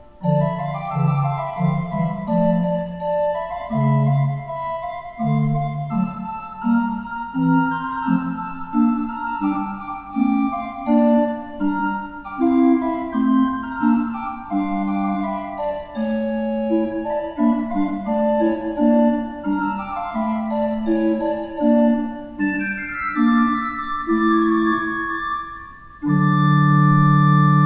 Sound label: pipe organ; Tzschoeckel